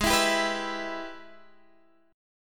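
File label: Ab Minor Major 7th Sharp 5th chord, Guitar, Drop D tuning; strummed; x,11,14,12,12,12; JGuitar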